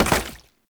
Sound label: Step Goo, Foley; Warframe; Oraxia